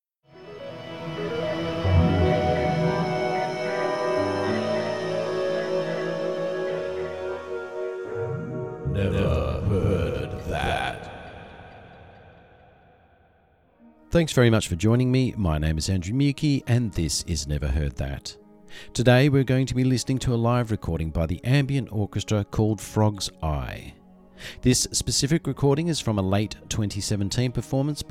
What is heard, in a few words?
Ambient Orchestra; Frogs Eye